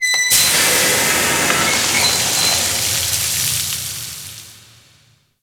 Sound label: Ocean Steam Blast Small; Warframe; Grineer Ocean